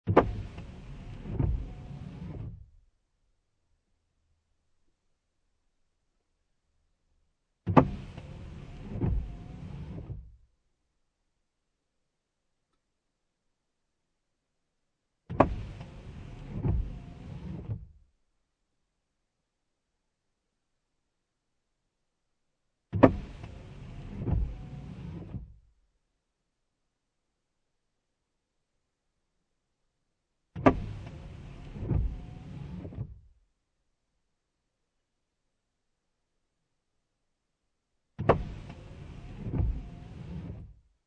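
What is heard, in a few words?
Car Interior Windshield Wipers Low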